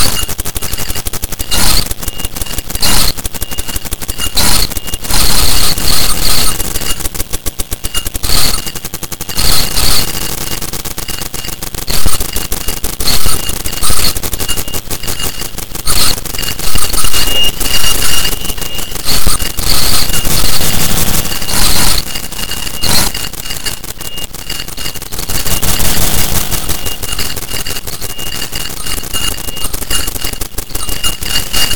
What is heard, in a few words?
noise